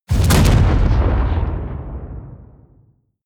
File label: detonation